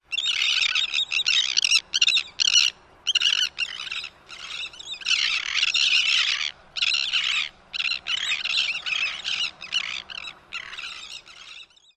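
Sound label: calls at colony, Elegant Tern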